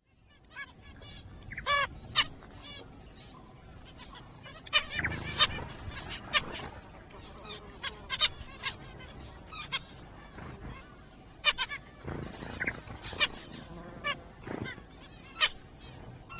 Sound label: zebra finch